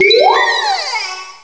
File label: cry; source of the sovereign